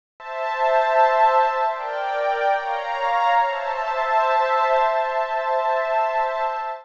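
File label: synth electro 140 A; Techno; Keys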